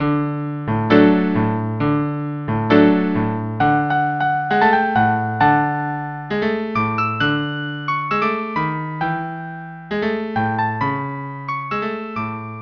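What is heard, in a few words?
violin viola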